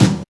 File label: BD